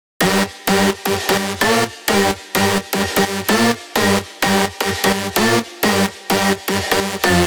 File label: 128 F Synth